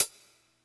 Hihat Closed, Yamaha PSR E305

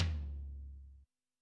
TOM, Yamaha SY85 Drums

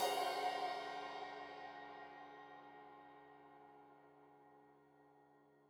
Crash 15in L edge, Cymbals Stereo

